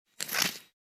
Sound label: retractor, Surgery; Medical; Shitmed